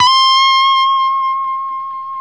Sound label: LES PAUL C 6; Spectrasonics; Hans Zimmer Guitars VOL-1